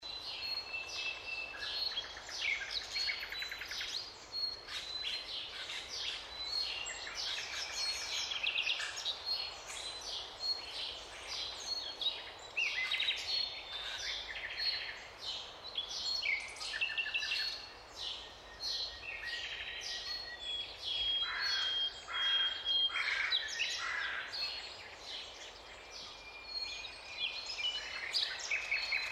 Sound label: Flying Islands (Many Birds Heavy Wind)